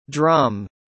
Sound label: drum